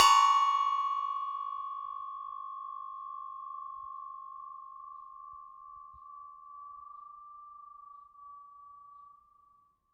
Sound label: Metal Teapot Stand Strike